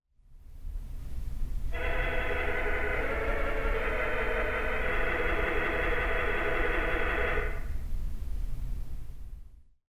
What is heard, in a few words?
Vox Humana, theatre organ